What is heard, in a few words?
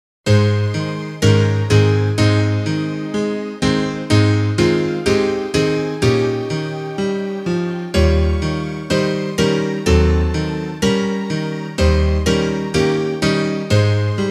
instrumental